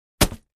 Axe Paneling 2 Side Du, axe hits

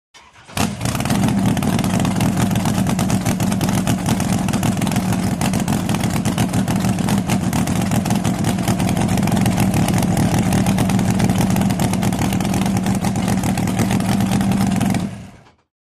Drag Boat Start idle

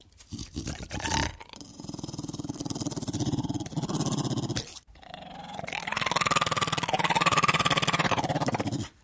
Pit Bull Growl Throat

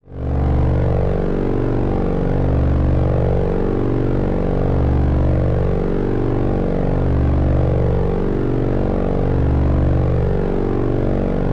engine hum, engines

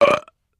Belch Shrt; belching

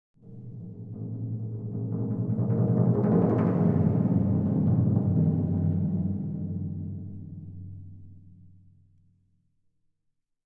Timpani Mallets Symp

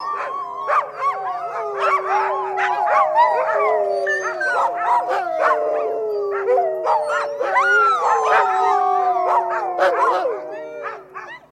Dogs Howling Sadly, husky

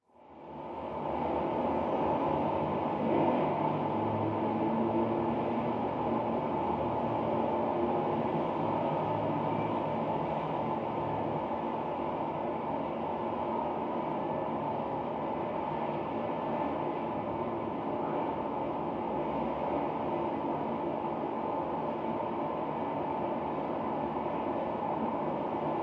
Traffic Busy City

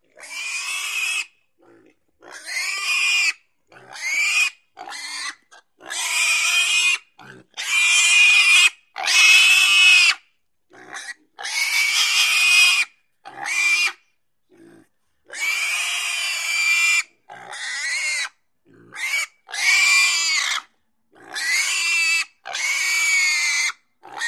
Pig Squeal Multiple

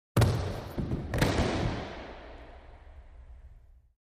Foot Stomps Hard, racquetball

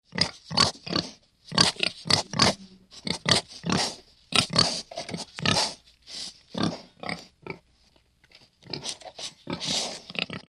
Pig Grunting

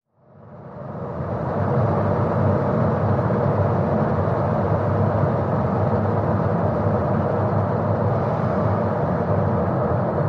SUV Drive At 25 35 Mph

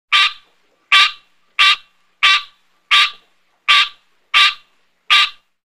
Frog Tree Honks High

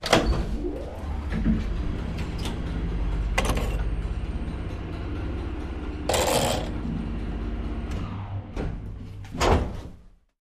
Elevator In Booth Run; hinges & creaks

